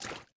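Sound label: water run right, footsteps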